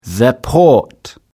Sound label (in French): Port anglais; MOBICLIC63